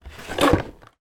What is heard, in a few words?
pizza box close